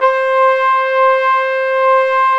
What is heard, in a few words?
WND FLG SO, Hybrid Winds Flugel & Sop